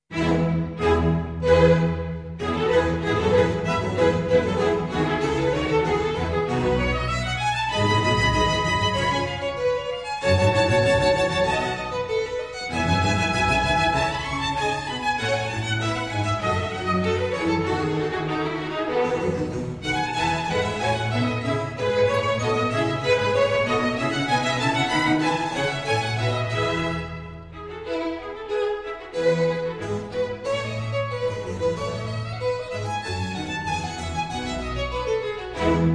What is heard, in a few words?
Concerto in E Major for Violin and Strings; Concerto No. 2